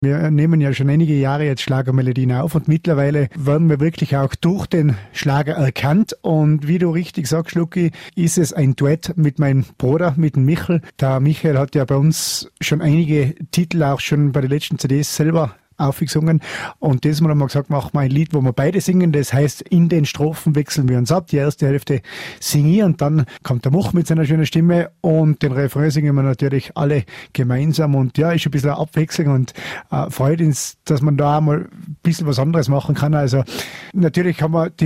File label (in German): Duett